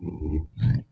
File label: Cows Grunt; Civ4; Resouces and Improvements